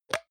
hygiene small cup open hard; haus-garten; badezimmer

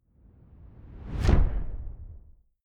rising wood low soft

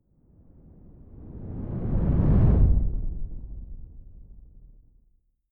whoosh simple low long grainy

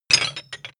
clank metal massive; maschinen; soundpool

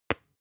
spice jar smack open damped

